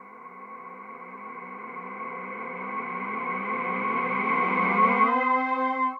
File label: synth pad ambient 160 C; Sphere